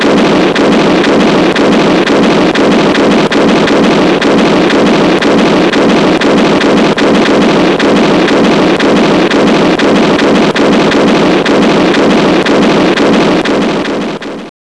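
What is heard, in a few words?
artillery, warbirds fx; artillry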